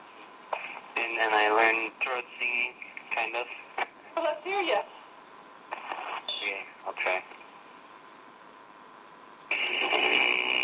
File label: Mongolian singing